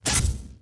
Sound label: laser